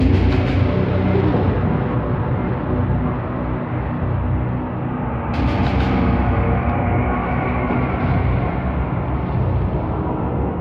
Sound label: Music Ambience; gamerip; Hellbound